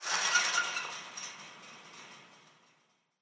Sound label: chain link rattle, metal; Half Life Alyx